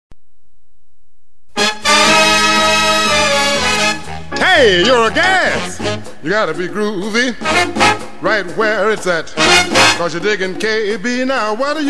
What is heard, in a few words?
jingle